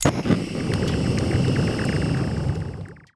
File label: bunson burner; Stellaris; astral planes